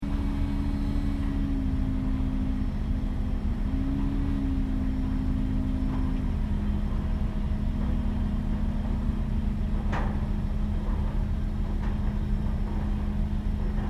Construction Site Drilling Rig Repositioning, Japanese construction drill site